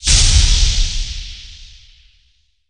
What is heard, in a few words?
overheat; zrush